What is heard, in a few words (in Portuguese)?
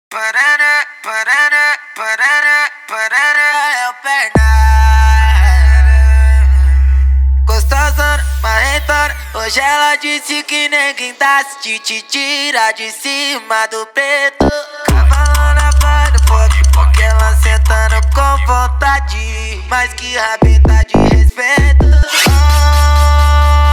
funk automotivo